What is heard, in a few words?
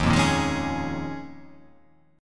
D sharp 7th Suspended 2nd Suspended 4th